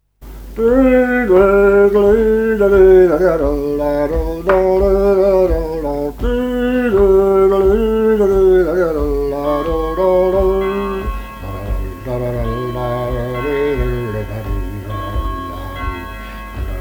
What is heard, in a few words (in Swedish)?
Brudmarsch